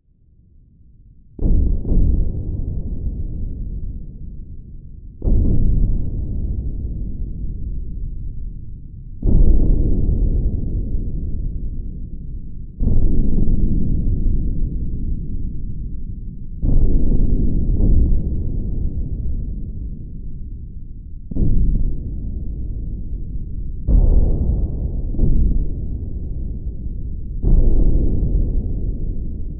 Warfare World War Two Battlefield Distant Explosions; Warfare WWII World War Two Battlefield Distant Explosions; Part of the LYN083 Warfare Battl